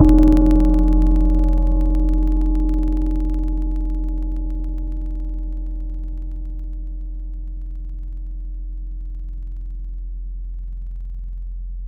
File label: Mallet B; Synthetic's Serum Collection; Surge Sounds - The Sky - Serum Wavetables